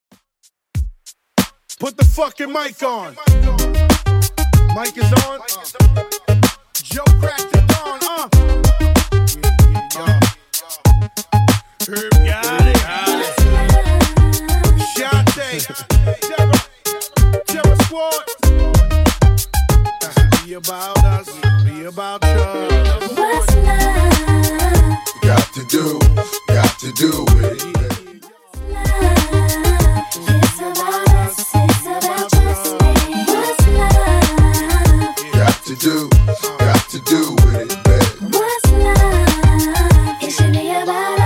95bpm

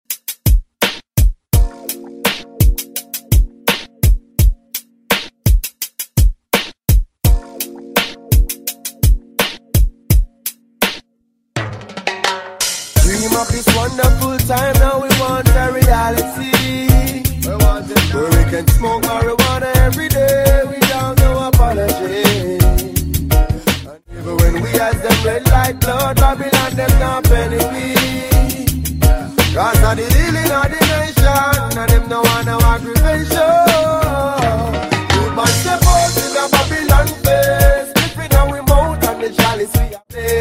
Reggae Re Drum Quick Intro

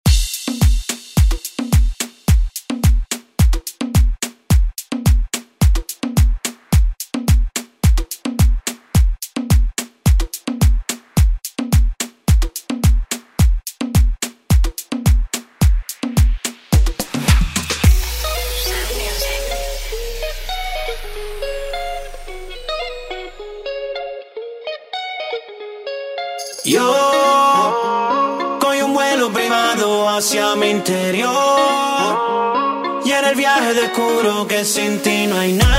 Latin urban; Deejay Hit; Hit Latin Urban Redrum

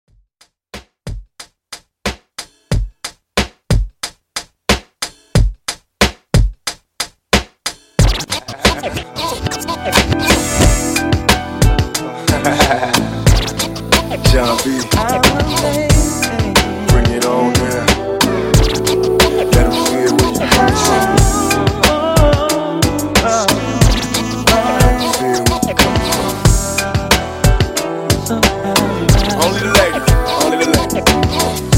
90s Hip Hop, 91 Bpm; ReDrum

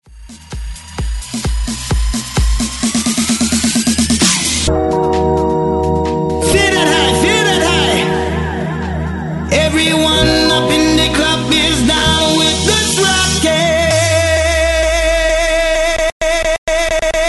130 BREAK MIX; DJDOLLS

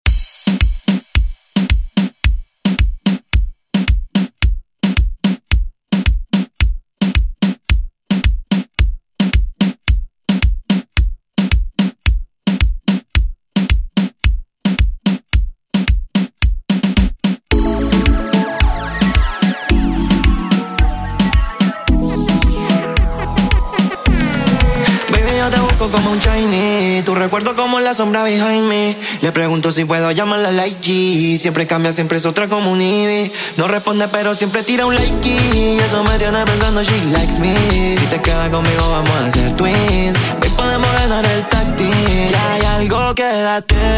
ReDrum; DJ Mhark

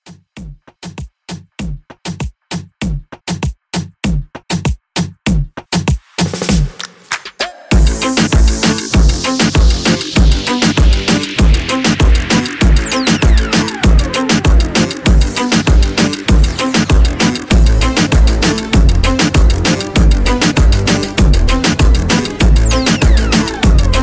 Reggaeton 98

